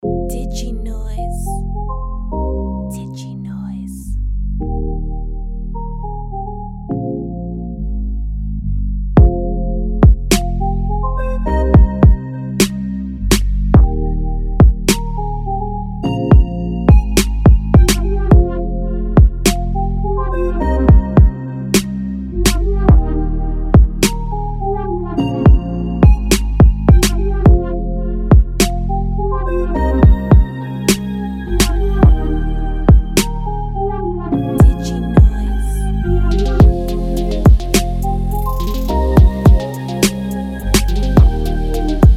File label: soul trap & R&B promomix; Diginoiz; Spire